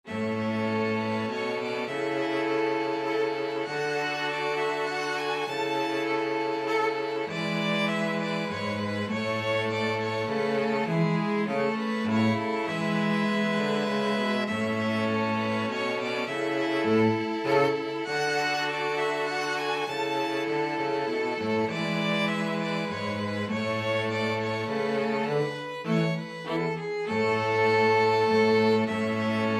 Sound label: minuet, string quartet; bach